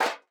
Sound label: phys impact metal sheet; Rust